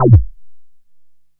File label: synt, Juno-2; Giga Samples Collection; Bass